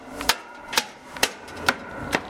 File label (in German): schritte auf metalltreppe